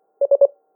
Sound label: cliff sensor fail; miro2; miro-e